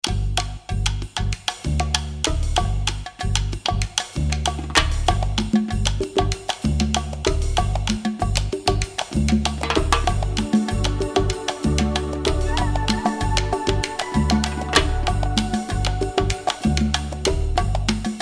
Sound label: Drums In Space